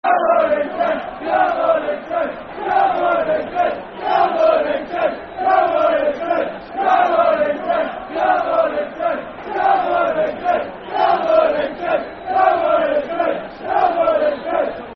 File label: Come On Football Chant (Soccer Song); Come On Mk; Milton Keynes Dons Mkdfc